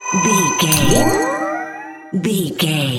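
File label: spell recharge power up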